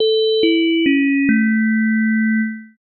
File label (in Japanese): お知らせ・終わり; 戏剧音效